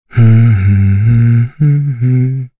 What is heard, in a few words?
Vox Hum; kingsraid; voices heroes en